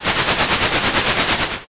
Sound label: comm noise